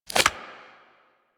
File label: Load Arrow, Weapons; Warframe; Infested; Inf Cernos